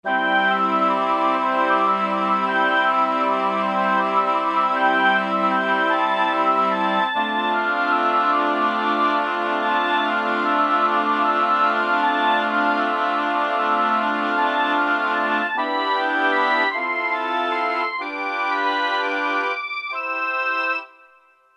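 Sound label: reed spring; E-mu Proteus FX; Factory Presets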